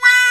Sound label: Trpts; Club-50 - Foundations Roland; Trumpets; Wa Trpts